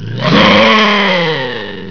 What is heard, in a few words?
SNARLER, ANIMALS